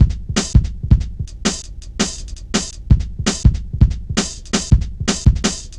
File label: Drum Loops, Jungle